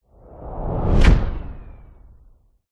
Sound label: sound design cinematic hit slam powerful